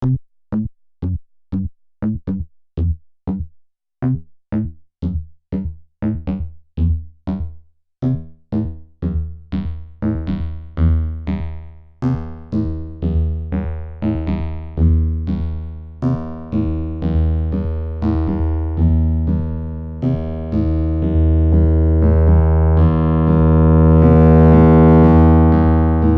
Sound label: Zendelay; Ninja Tune